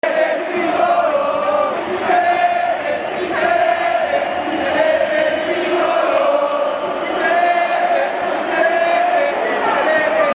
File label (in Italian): vincerete, cori